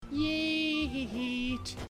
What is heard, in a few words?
soft yeeeeeet